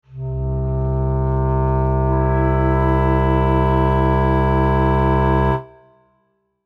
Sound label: clarinet